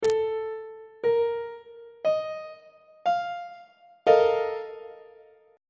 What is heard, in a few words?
b flat major 7th suspended 4th 3rd inversion on treble clef